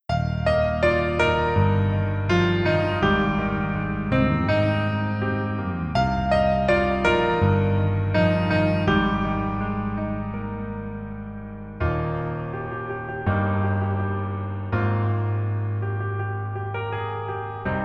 Piano ver, 멜로디MR

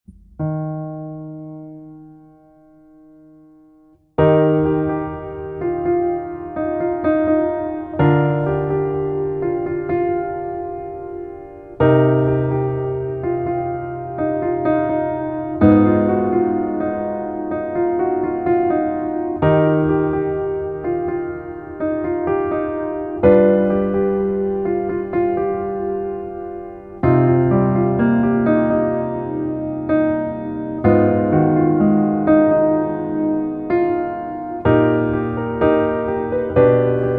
Piano ver 멜로디MR

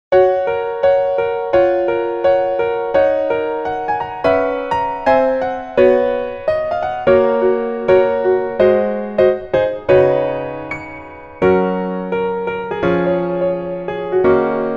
Piano ver, 멜로디MR, 여자키

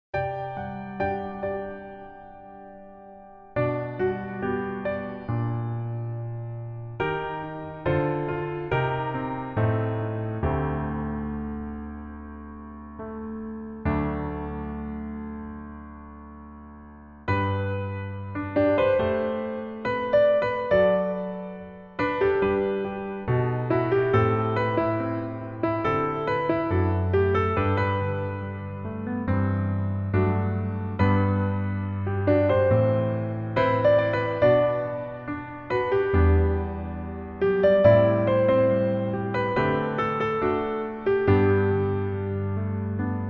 Piano 멜로디 MR

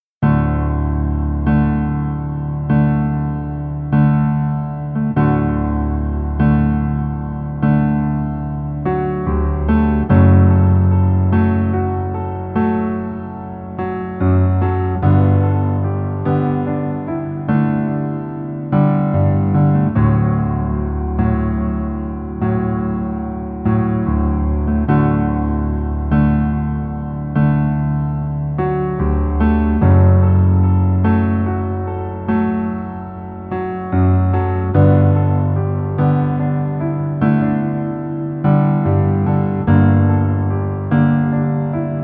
Piano ver 멜로디MR -1키

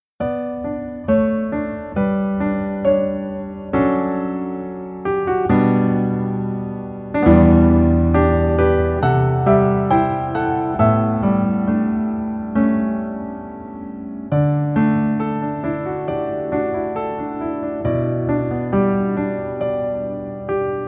Piano ver, 멜로디MR

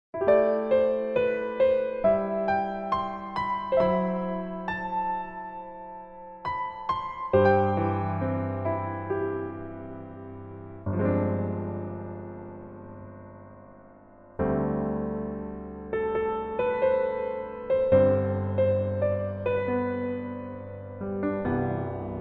Piano ver 멜로디MR

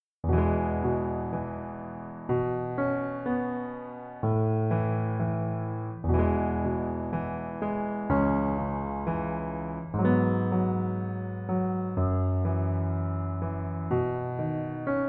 Piano ver 남자키

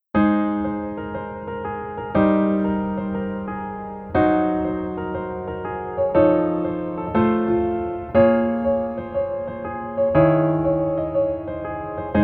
Piano ver 멜로디MR 여자키

